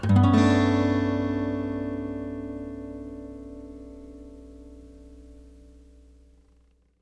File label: Acoustic Guitar Chord, G Maj; Instruments; Guitars; Cymatics; Light Free Sample Pack; Bonus Samples